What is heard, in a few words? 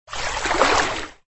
Wade